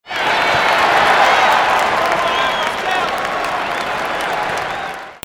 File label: CROWDS